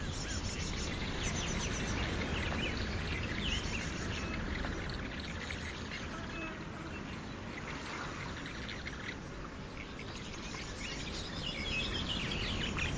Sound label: ambient white dry forest